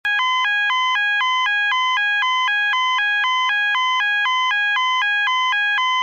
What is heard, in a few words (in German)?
ton, Signalgeraete